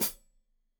Hat Foot, Hi-Hat, Cymbals Stereo

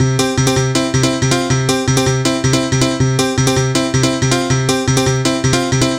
piano loops, Hardcore Loops; Dance Synths

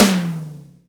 TOM, House Side Of 2 Step

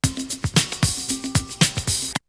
Drumloop; ZERO G - Datafile DISC 3

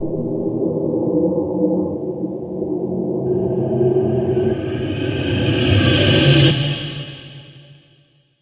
SPACE-FX

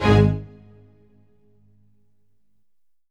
ORCH HIT G#; Q Up Arts; Denny Jaeger Private Collection

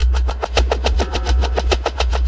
Ripple Perc

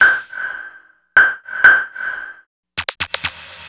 FX; Sonic Foundry; Stylus Pressure Urban Grooves on Digital Wax; Gotta Bounce

